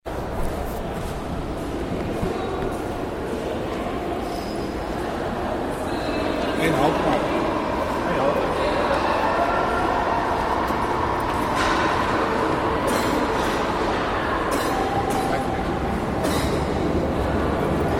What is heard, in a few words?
metro frankfurt; fieldrecs